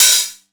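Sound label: 909 open hi hat; Wizoo - Platinum 24 Electronic; 909 TINY P1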